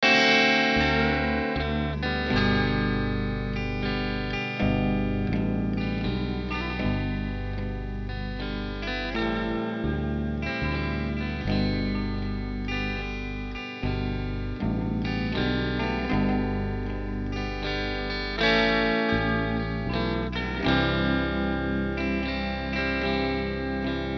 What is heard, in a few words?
the big strum